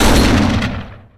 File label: shotgun fire; weapons; Dystopia